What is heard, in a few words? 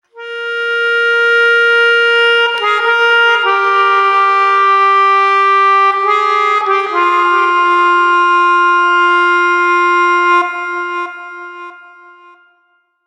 Reggae shot